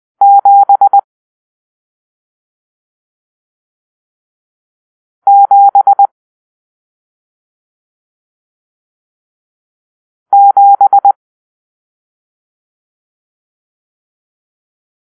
Numeral Seven (7), morse code

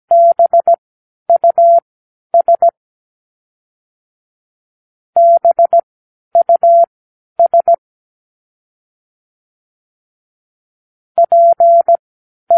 Practice words, morsecode

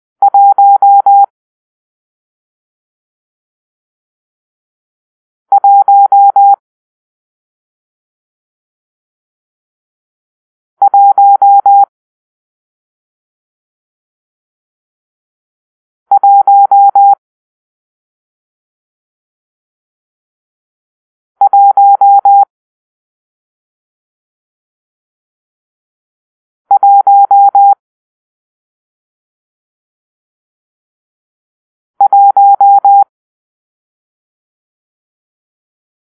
Numeral One (1), morsecode

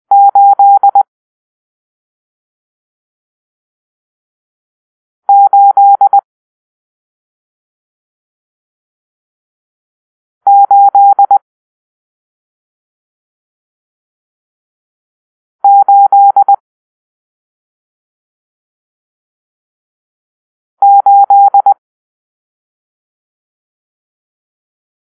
Numeral Eight (8), morsecode

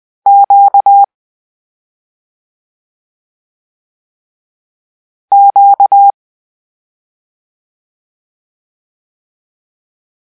Quebec (Q), morse code

